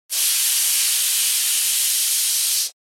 Cooking steam release hiss burst

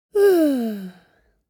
Little boy tired yawn

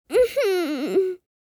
Young boy crying

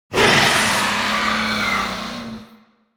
Juvenile dragon roar

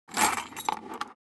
Picking up from a jewelry casket

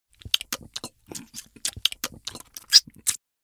Cartoon eating fast ravenously funny

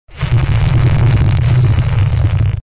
Extreme meme fart blast; bass boosted

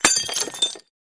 Glass breaking small; Glass Sound Effects

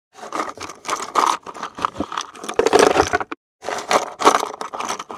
Board game box shuffling contents; leisure

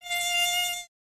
Mosquito fly pass by fast

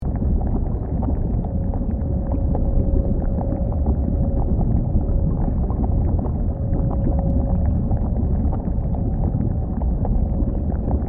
Lava cave ambience

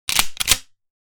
Shotgun fast racking pump action

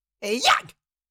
Cartoon little monster voice jump

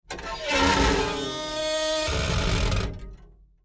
Metal wires tortured texture

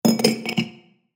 Stacking glassware; kitchen

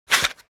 Match strikes no ignition

